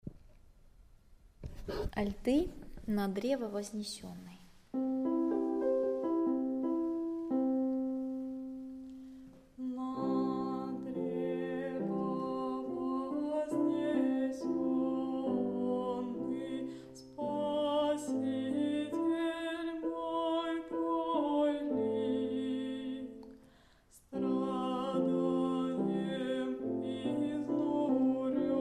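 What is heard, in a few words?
alto; Na drevo voznesennyj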